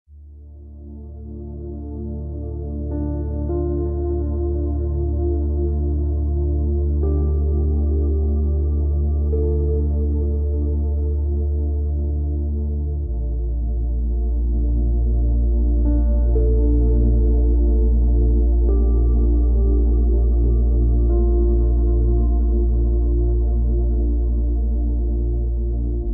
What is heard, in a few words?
Instrumental